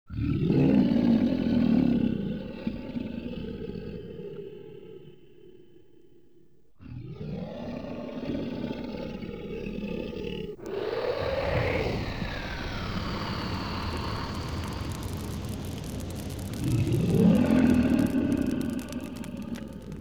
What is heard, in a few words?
Dragon Burns Its Fingers; Legends; BBC Sound Effects Library